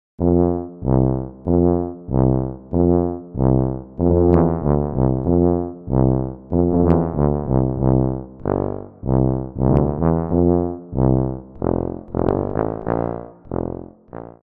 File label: Brass Tuba Legato; Aeternus Brass; Syntheway